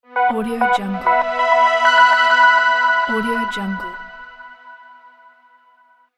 bright short logo

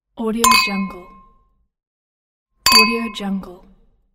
glasses; archisound

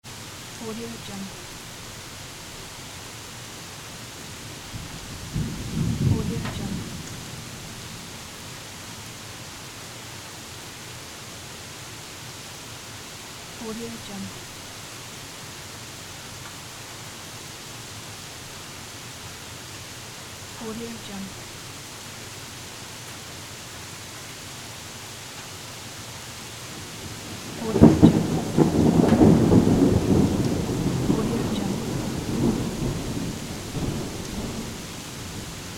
thunderstorm

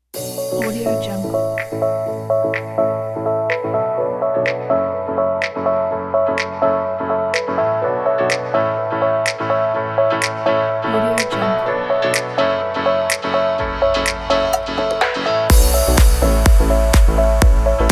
electronic summer house

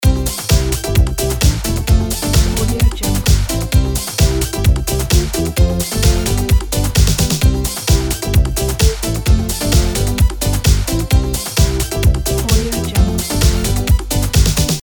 happy upbeat loop; gamechestaudio

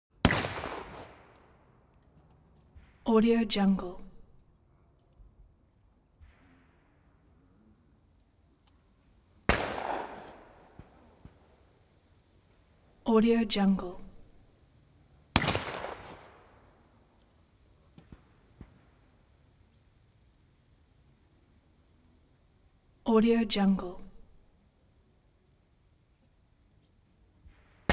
atmo range gun shooting range

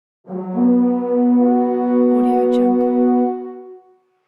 battle horn

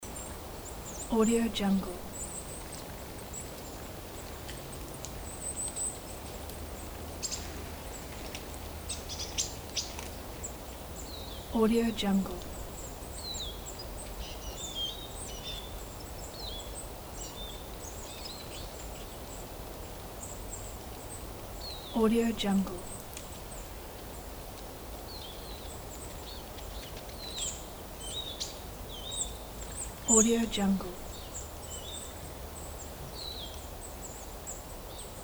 quiet november forest ambience loop

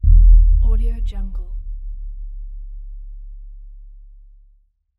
bass drop

